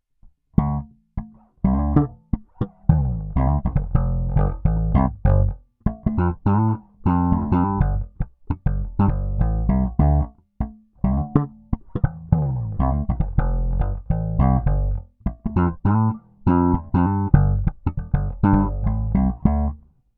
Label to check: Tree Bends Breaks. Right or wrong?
Wrong